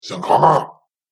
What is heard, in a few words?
Alien Monster Voice